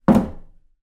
household door internal wooden push back into wall